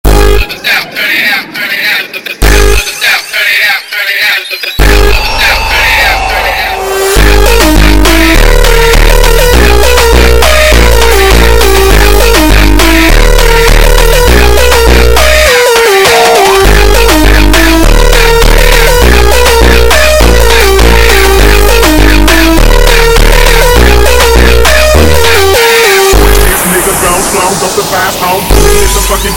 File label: Montagem super Slowed